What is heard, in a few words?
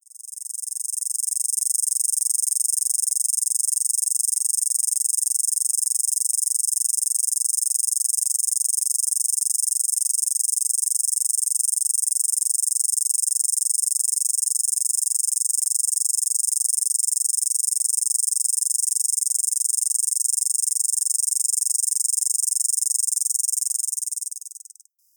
Diceroprocta azteca, cicadas, filtered; US; TX; East of the 100th Meridian